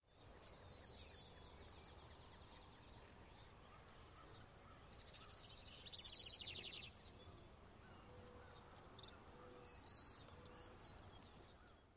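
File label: tsip calls alarm, Savannah Sparrow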